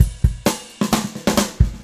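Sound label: 130 bpm, beat loops; Zero-G; Monster Beats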